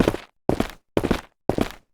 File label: walk; library of ruina